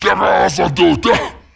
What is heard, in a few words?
orc angry